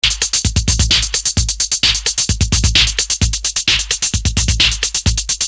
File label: Loop